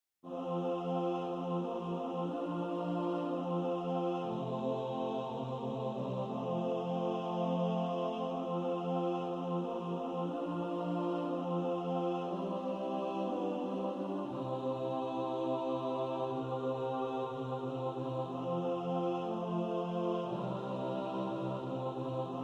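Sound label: tenor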